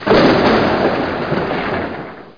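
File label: lightning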